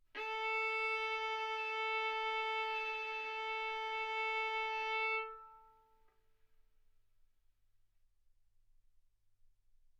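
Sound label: Violin arco mf A string A4 B4 stereo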